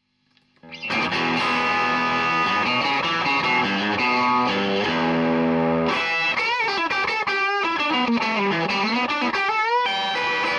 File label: BST, preamp